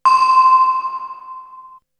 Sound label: Perc; ZERO G - Datafile DISC 2